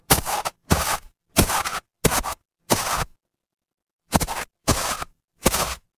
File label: footsteps snow